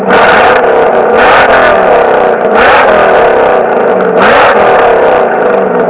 rev

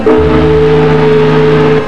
foghorn

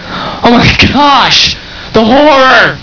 horror

